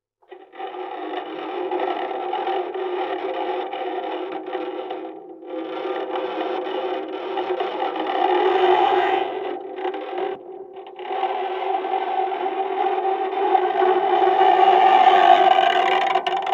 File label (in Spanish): Cuerda Fresgada